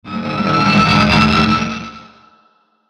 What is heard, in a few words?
Whoosh Crunchy Ascend Fast; Blastwave; Whoosh Sound Effects